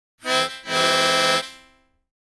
Mouth-organ, Car/Truck Horn, Type 2 - Low, In Two Voices; woodwinds